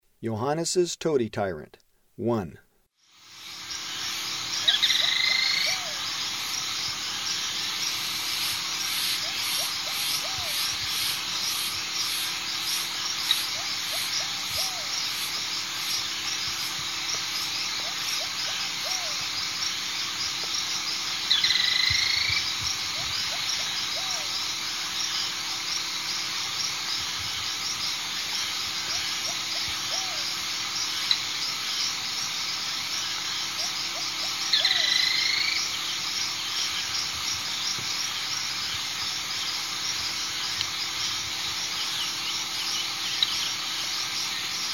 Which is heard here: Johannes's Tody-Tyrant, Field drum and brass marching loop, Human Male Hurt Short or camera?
Johannes's Tody-Tyrant